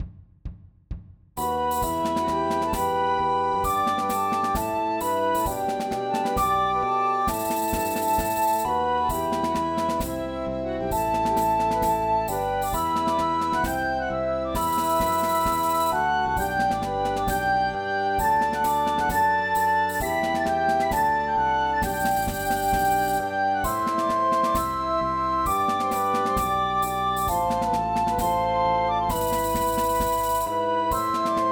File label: Bush Dance; MTWFC VOL1 Audio Accompaniments